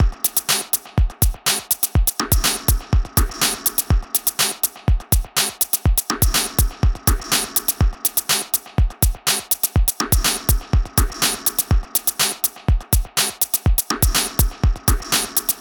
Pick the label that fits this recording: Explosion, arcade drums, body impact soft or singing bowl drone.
arcade drums